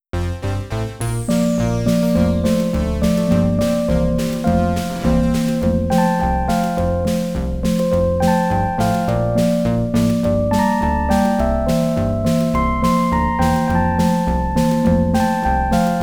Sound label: Jingle Bells, Synthmas